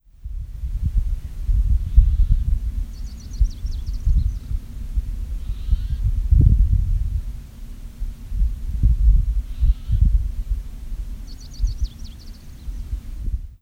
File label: rufous-crowned sparrow